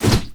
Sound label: ravenous basic attack; LoL; yorick